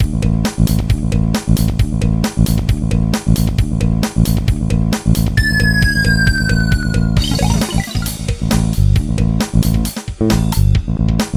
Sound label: Nokia Box